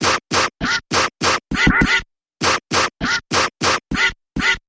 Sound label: Mechanical Percussion Variation Short Version